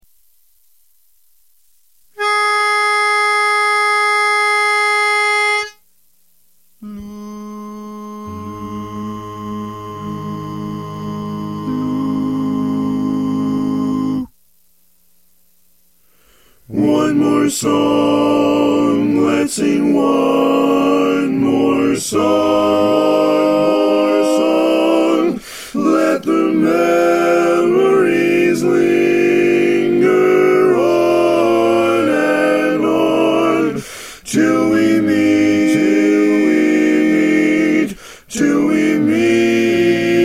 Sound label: tenor